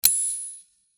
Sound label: Sword Sound; FXHome; Fighting Sounds